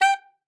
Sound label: sax g5, Saxophone stacc forte; Session Instruments